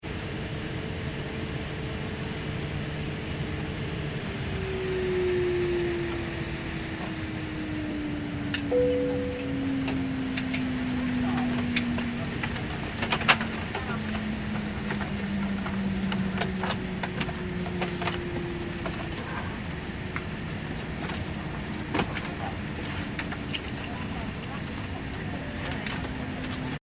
transportation aircraft commercial airbus a340 interior disembarkment, Internal Disembarkment Ambience; Airplanes; Vehicles; McKinney Sound